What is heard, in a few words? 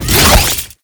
Mine Launch; Tau; Twelve Minute War